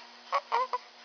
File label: cooing